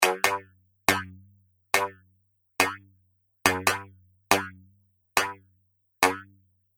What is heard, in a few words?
140 Bpm Droid Boogie Loop, Robot